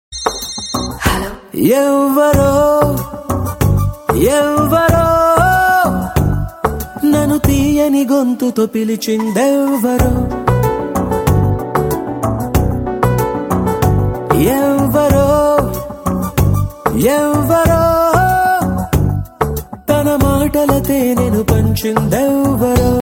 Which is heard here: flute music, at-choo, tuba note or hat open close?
flute music